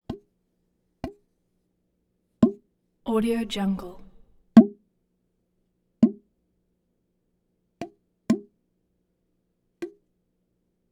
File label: hose doink